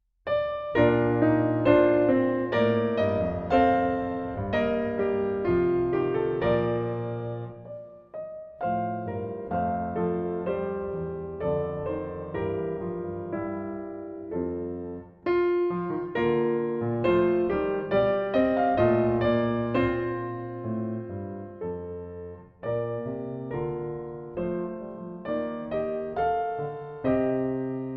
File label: Arr. for Piano; Nun ruhen alle Walder, BWV 392; Erik Satie